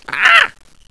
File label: pain